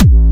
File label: Kick Bass Subs A